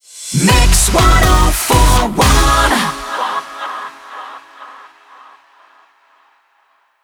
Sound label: 122bpm c Rapid Fire; WBMX 2017